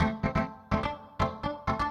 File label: SYNTH HOUSE 125 A, Keys; eJay